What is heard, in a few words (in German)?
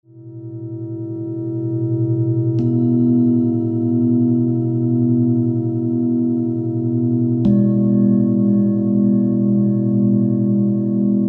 Klangschalen Träume; Die Schwingungen von Feuer, Erde, Luft und Wasser genießen